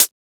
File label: Closed Hat